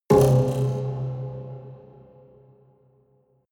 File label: haunted strings buzz